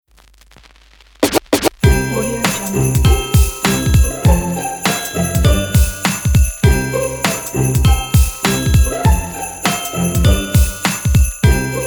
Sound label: hip ho ho hop christmas modern holidays; dabbling-duck-productions